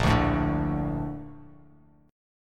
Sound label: A sharp Major 7th; A#M7; strummed; JGuitar